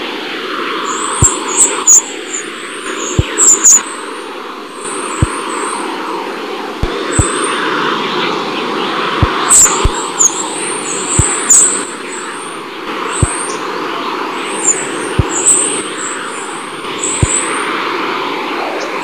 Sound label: Cluster chorus, Plasma Sounds